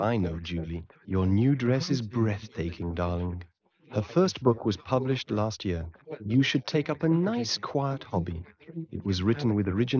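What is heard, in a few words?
male, instantaneous mixture; SiSEC11; underdetermined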